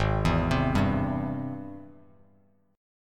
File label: Ab Augmented Major 7th Ab Bass Standard; arpeggiated; 4,7,10,0; Ab+M7; JGuitar